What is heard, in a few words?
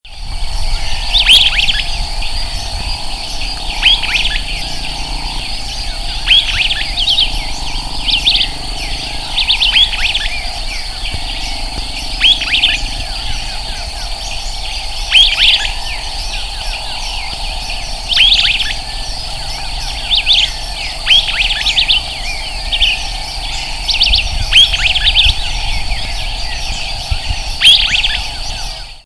Myiodynastes maculatus; PE Intervales SP